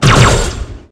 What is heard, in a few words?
bullet halo lazer; minecraft